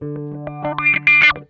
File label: OUCH, Funk Guitar; Zero G - Funk Guitar